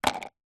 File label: food candy bubblegum tape plastic tub set down